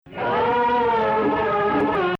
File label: Rast Sihtu Nahawand; Maqam Rast